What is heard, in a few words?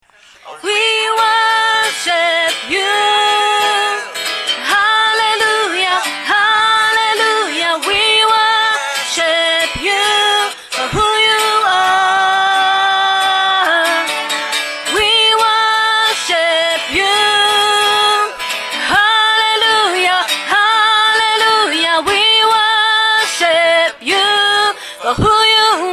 alto